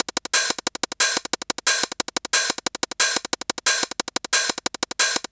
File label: Hi Hat Loop; Sonic Foundry (Sony Creative Software) - Fluid Dynamics Drum & Bass; Construction Kit